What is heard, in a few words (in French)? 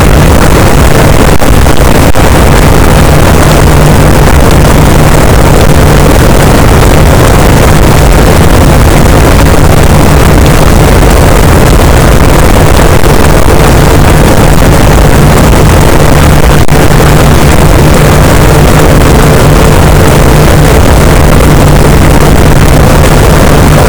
cachalot positif, boat; Physeter Macrocephalus